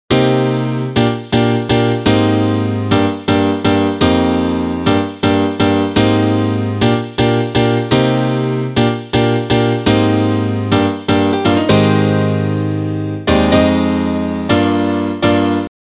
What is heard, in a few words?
House & Deep House; unisynth